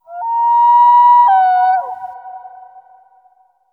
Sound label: Lagoon Rand Bird